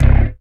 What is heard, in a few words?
dance chord